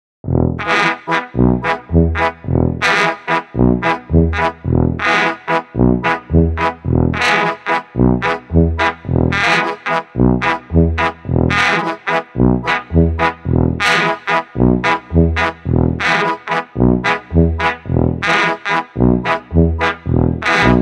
Underscore, Balkan Brass; Narrative Mind

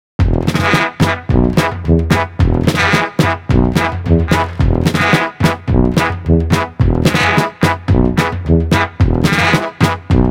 Underscore, Balkan Brass; Narrative Mind

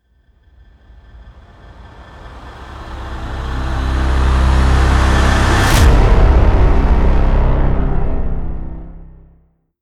Impact, trailer tools, alarming; Narrative Mind

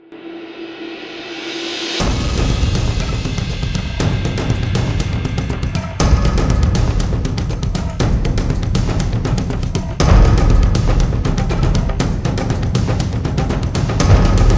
Fevered Heartbeat, Hard Hitting; Narrative Mind

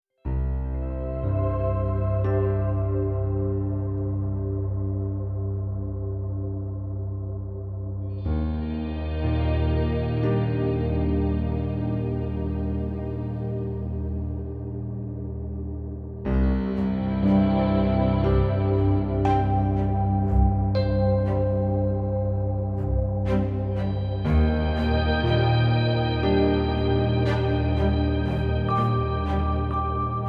Stream Underscore, Percussive Motion; Narrative Mind

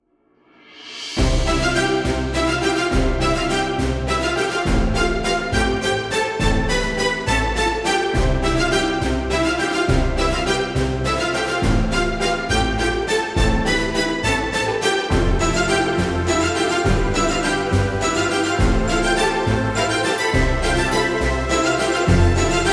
The Great Outdoors, Classical Pompous; Narrative Mind

